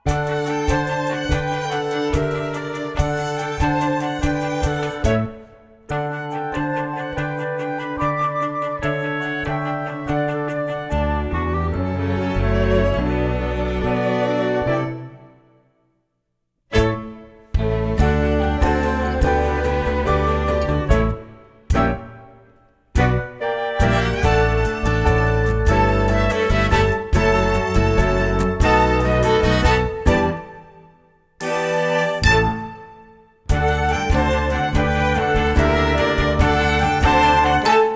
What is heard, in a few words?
instrumental